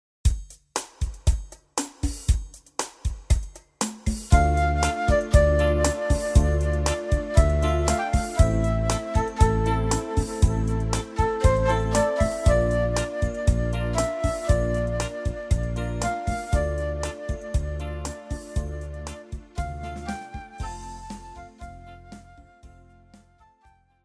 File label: Longing melody; author playing